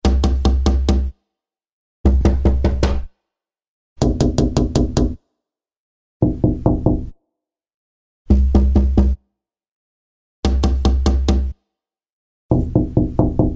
Window Knocks, Various knocks and rapping on windows x 10; Household